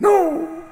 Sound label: Incorrect; OverTheBrink